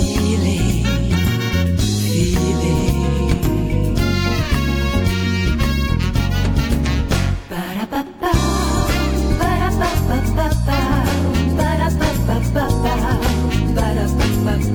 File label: Samba